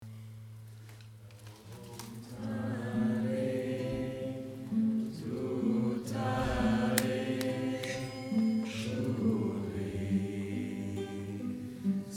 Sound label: Om Tare